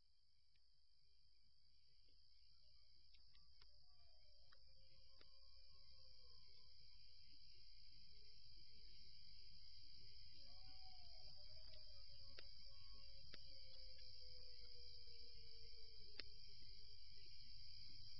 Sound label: Coyote and Crackling Campfire